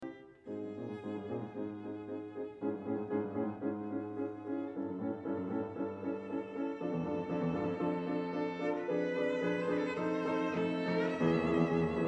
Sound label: arpeggione